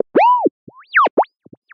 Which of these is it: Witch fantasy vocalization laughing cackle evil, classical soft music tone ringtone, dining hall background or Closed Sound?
Closed Sound